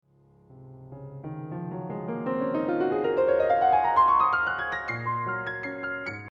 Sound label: A scale